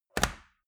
metal box small close hard; maschinen; soundpool

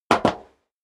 step sneaker metal dry jump; schritte

